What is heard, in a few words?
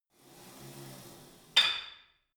pullup machine cable release weight impact; sport freizeit; fitnessstudio